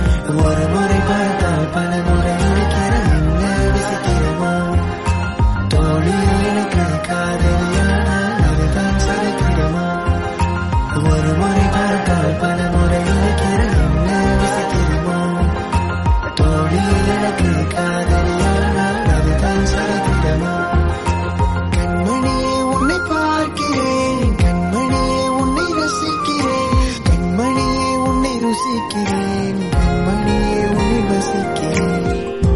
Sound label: tamil love cut song